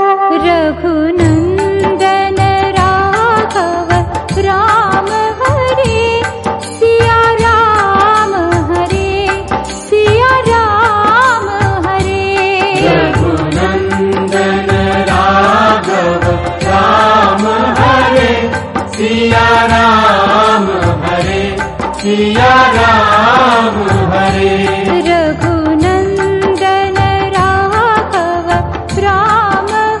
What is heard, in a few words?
Raghunandan Raghav Ram Hare - Female Version